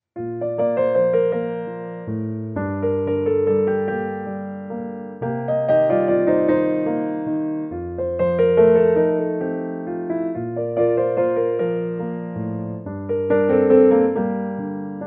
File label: Ringtone